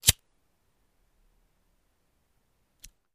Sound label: Bic Lighter Light; lighters & matches